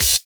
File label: Open Hhat, HiHat; Equipped Music; Loungin House